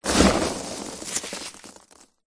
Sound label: office foam packing peanuts in box hand in movement